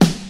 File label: eighties puffy snare drum g key; Mono